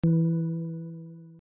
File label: Signal C